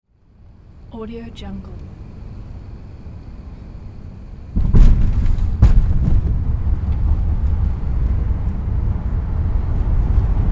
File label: airplane landing interior